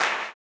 CLAP, blues & soul; ILIO; Vocal Planet

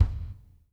PIANO HIT, KICK DRUM; Sampleheads; New York City Drumworks VOL-1

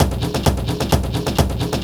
drum 130, techno trance drumloops

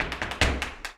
Flamenco, Tapping, Zapateado Solo

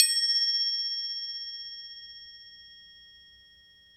Crotales, Orchestral Perc

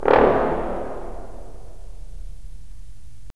BRS, Roland LA Composer

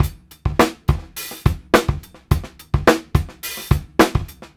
Live Beats, R&B Drums

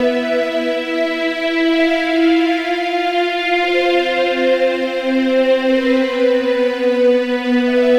Pad, Trance; Sonic Foundry (Sony Creative Software); Trance NRG VOL-2

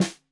Snare 65 Reg Stereo, Deeply Sampled Drums

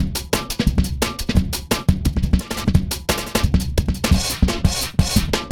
174, loops drums; BubbaWallace; RoBKTA Sample Pack Supreme

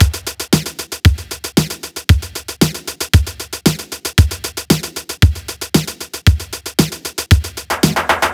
115 Beat Lo-Tech; Beats; Zero-G; Future Wave

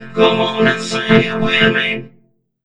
Funky Vocals; USB Soundscan vol.18; AKAI

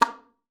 SLPB A#3, ALTOSAX; Xsample VOL-2

